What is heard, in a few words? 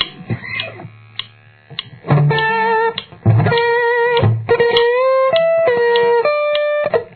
outro